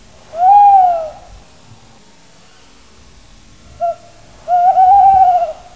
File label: tawny, birds